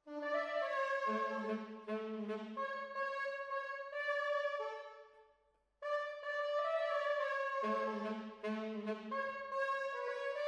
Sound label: Horns Over